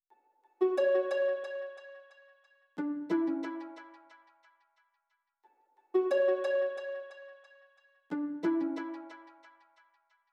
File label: 90 BPM F# Min Harp; Loop Stems; Cymatics; Lucid